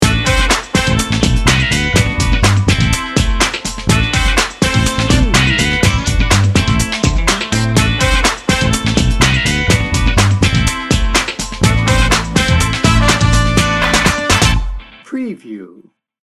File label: funky shoes